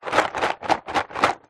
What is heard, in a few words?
dominos wooden in card box shake